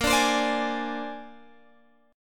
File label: A sharp 9th A sharp, Guitar, Open G